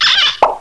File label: Cork; CELEBRATE THE NEW YEAR; HOPE-MERRILL HOUSE; New Years Eve Party